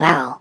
death; Gnomes; OldThink

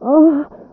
under moan f; Interactions

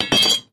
sabre drop; Items handling

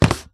cardboard box drop; Items handling

